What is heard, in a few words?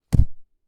Book Impact